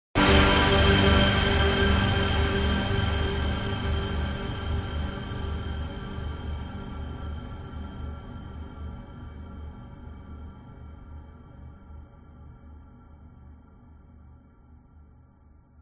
sound design cinematic stab orchestral hit dramatic sudden